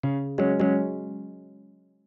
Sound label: App Error Serious; Oxygen; plasma